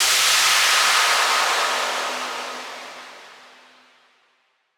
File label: Devolution White Noise Down; House; FX